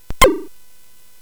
Tecnetium, CSG8580R5; hvsc

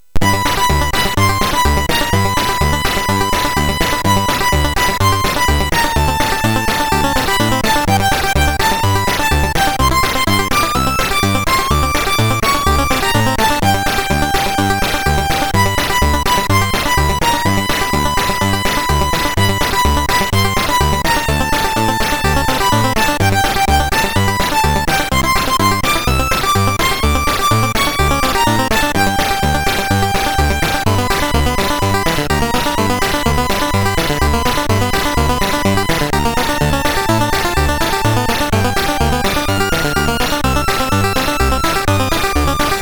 Final Synth Sample, MOS6581R3; hvsc

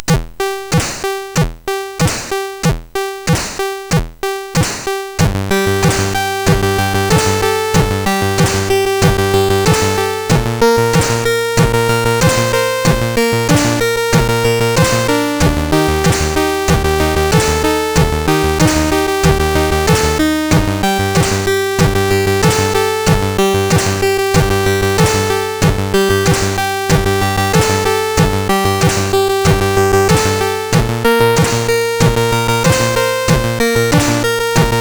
Reggae, sid MOS6581R4

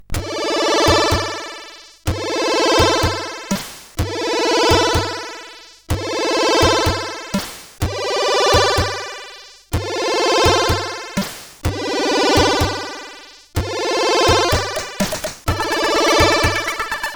Intro Jam, CSG8580R5

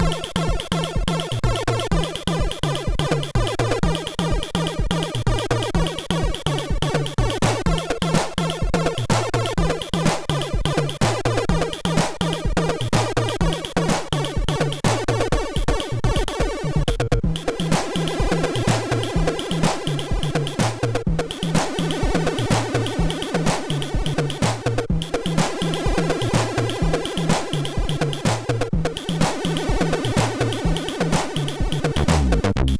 La Samba sid MOS6581R4

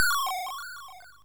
Fuzzball, MOS6581R3

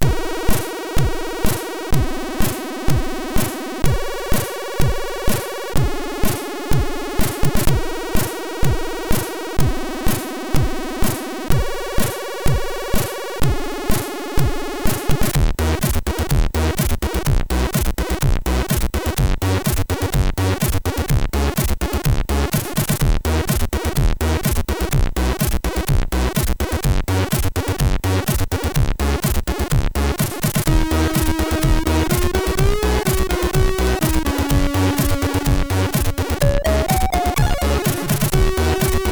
music, sid, MOS6581R3

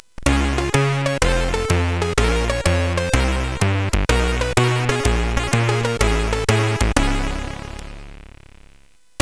JAZZZ, CSG8580; hvsc

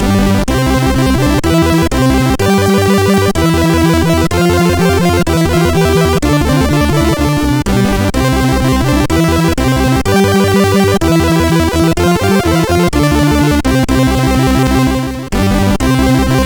crack intro, MOS6581R4; sid; Genesis Project

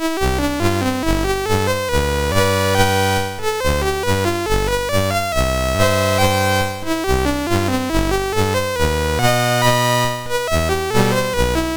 Polka, MOS6581

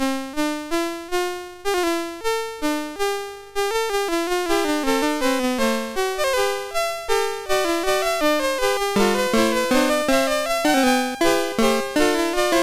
Fugue No 2, MOS6581R2

